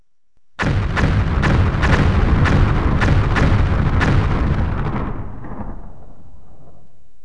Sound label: explosion; WEST DEMO